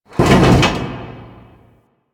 Large Vehicle Creak; Ambience; Props; Warframe; Nineteen Ninety Nine

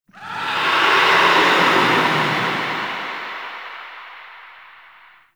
Pain Verb; Enemies; Warframe; Tau; Sentient Dactolyst

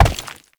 Step Rock; Warframe; Uriel; Movement; Steps

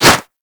Whoosh, Weapons; Warframe; Furax

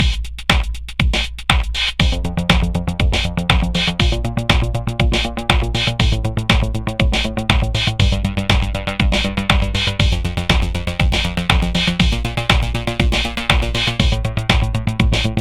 Market Music; Warframe; Venus; Fortuna

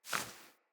Wing Flap One, Animals; Floppet

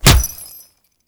Punch Impact; Warframe; Grineer; Royal Jester; Attack

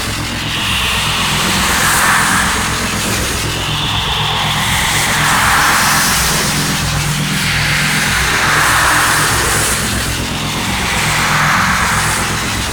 Ghost Ship Engine Loop

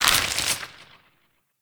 Mech; Weapons; Warframe; Tenno; Grimoire

